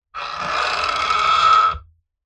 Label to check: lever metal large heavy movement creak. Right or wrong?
Right